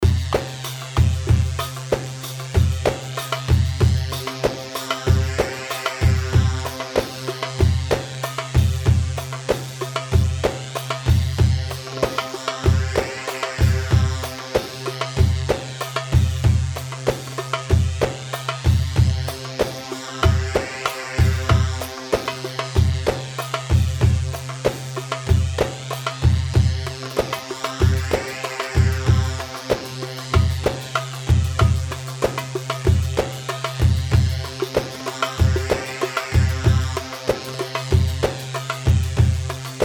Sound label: Saiidi 95 mix, Darbakati, Sharqi; صعيدي